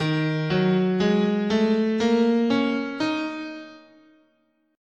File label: Eb Blues, scale